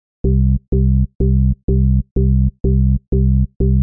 House Bass Loop

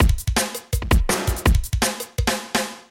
Its Jungle B

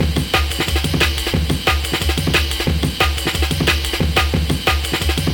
Heavy Jungle Amen

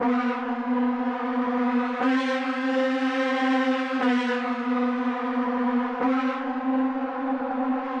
Pad

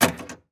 Pinball Game Sounds

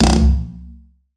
Percussion Beats

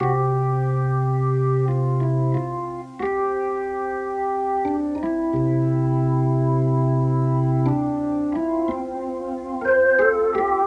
Organ

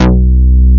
Moog Bass Hit

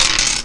Dice Roll; Dice Roll Fx

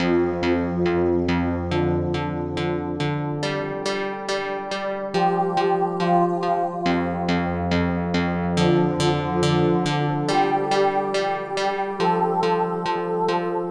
Synth Loop

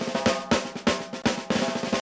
Drum Roll

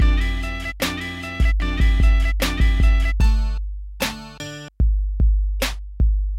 Hip Hop Loop 75bpm

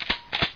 Gun Shot Reloading

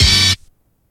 Orchestra Hit

